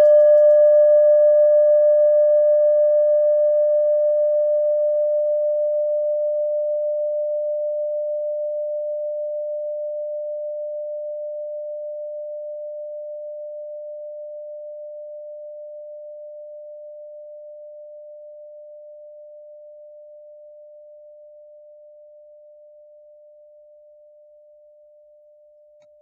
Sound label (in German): kleine klangschale